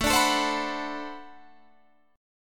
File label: D 13th, guitar chord